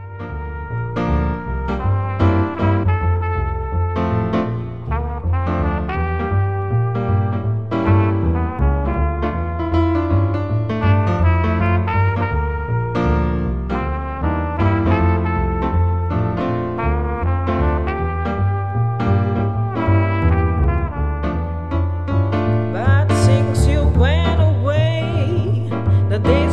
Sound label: Jazz Trio; Play Music Swiss